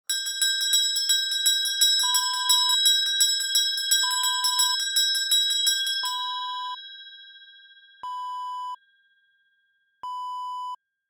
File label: handbell